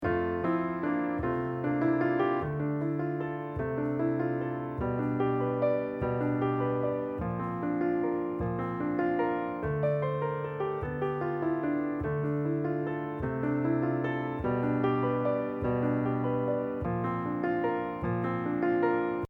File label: accomp; skye boat song